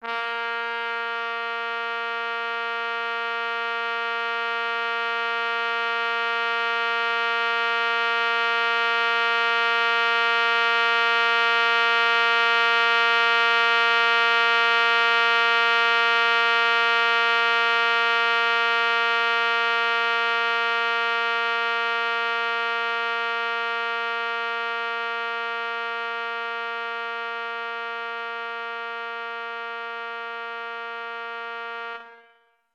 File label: Drone on Bb, trumpet